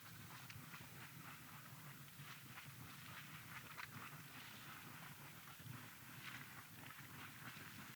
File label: Geyser Steam